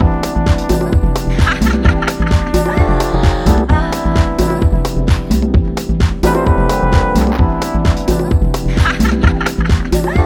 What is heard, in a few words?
Before Mix Original; stereoplacer